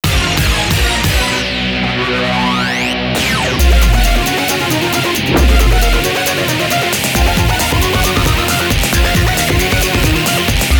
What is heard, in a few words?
Continue; Tough Dark Fight; PS2